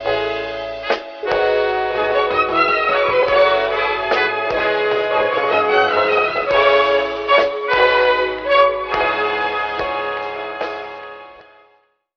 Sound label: strings voice, loops, phonky